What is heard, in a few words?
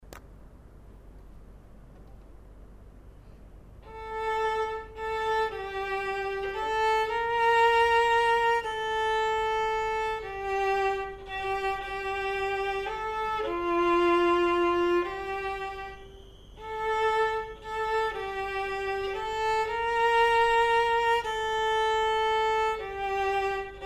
perf vln; Building Technique with Beautiful Music, Book 1 Violin